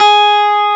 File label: GUI STRATO, E-Guitar; E-GUITARS